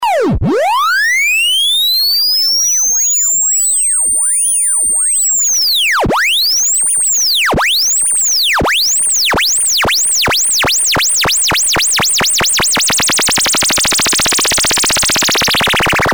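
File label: filter and lfo; c64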